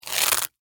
Crunch Floral Foam, Texture, Crunch, Short, Floral, Foam; Rip, Tear; Blast Wave